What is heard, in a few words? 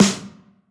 subtle reverb acoustic snare g key; snare drum samples